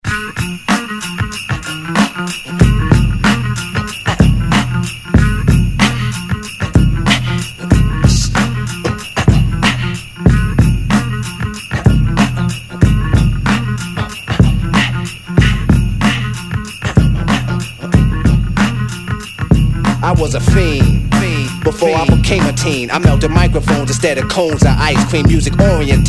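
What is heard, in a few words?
hip hop, Vocal Mix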